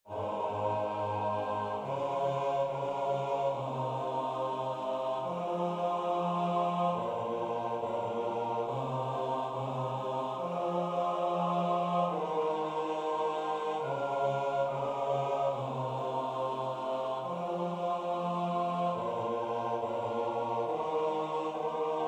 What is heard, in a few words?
Psalm bass